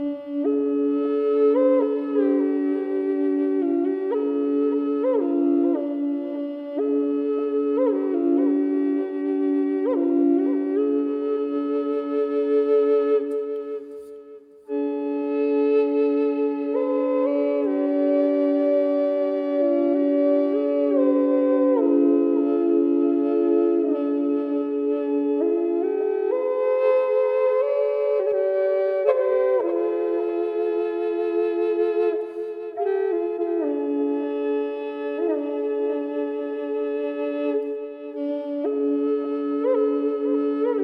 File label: Triple D with delay, native american triple drone flute; nussbaumholz; premium indianerfloete